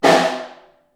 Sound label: SN Far, Snare; Ableton Foundation FreePacks; Snare Designer